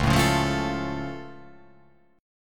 C sharp Augmented down, guitar chord

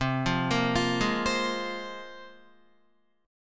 C 7th Suspended 4th Sharp 5th, Guitar; C7sus4#5 arpeggiated; JGuitar

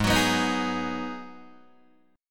G sharp Minor 7th G sharp, Guitar Open D

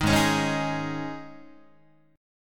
Ab Suspended 4th down Guitar; Absus4 strummed; JGuitar

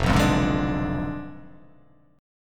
F Diminished 7th F down

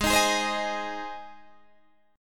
G sharp Major G sharp Guitar Open D, chord

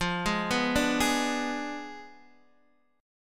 F Diminished 7th Guitar Open D, chord

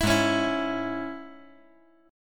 Ab Suspended 4th Sharp 5th, chord; 5G,4C,5E,5A; strummed; JGuitar

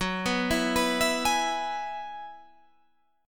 F sharp 7th Suspended 2nd Suspended 4th, down; arpeggiated; F#7sus2sus4

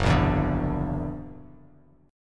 F sharp Augmented 7th, Bass Standard; strummed; JGuitar

